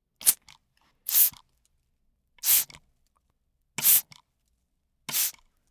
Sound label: spray